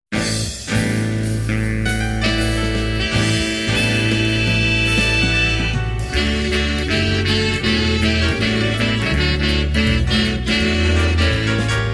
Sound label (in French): Hymne BigBand